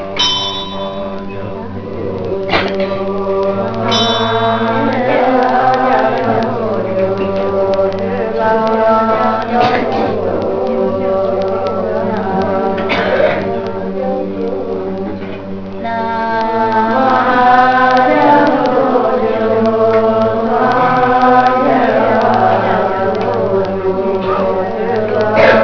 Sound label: chant